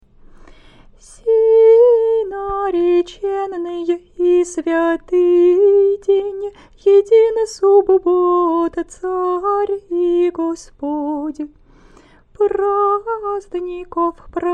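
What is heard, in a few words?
easter canon byzantine hirmos slower, singing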